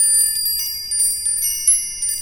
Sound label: Cool Rod Loop, ambient twinkles